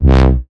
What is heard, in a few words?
synth